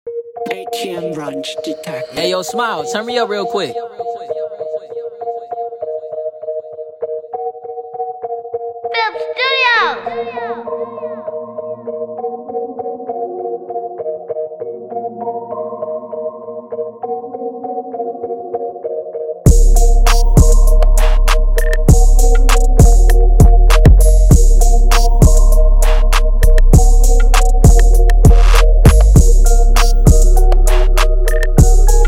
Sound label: club house